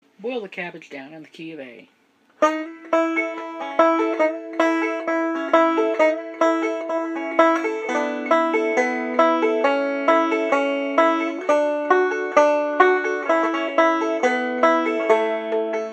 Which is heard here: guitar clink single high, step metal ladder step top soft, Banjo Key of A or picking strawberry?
Banjo Key of A